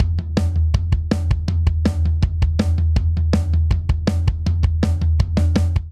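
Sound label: Punk Rock Intro 162 bpm, drums; 4 4; MIDI Clips; Ableton; Factory Packs; Drum Booth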